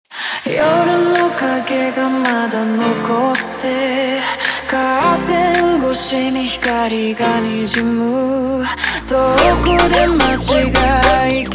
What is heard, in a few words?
Montagem Jumpstyle super Slowed